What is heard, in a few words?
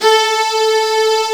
A 2 CELLO; AKAI S6000 CD-ROM - Volume 1; Strings